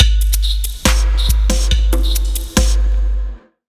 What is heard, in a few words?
Drumloop; Ghosthack